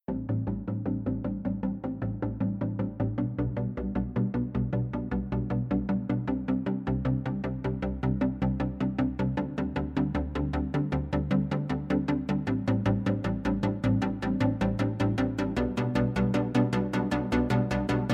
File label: Future Bass Chord Pluck EQ On